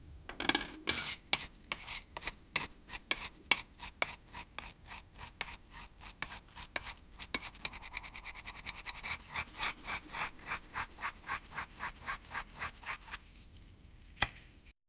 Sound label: roll out the dough with, food preparation